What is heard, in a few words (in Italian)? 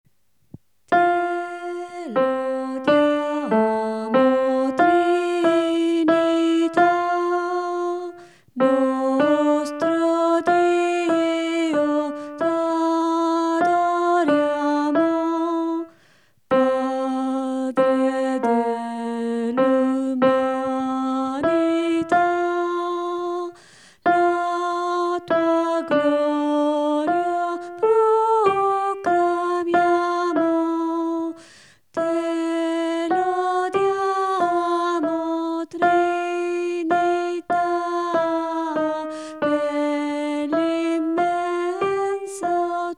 Te Lodiamo Trinita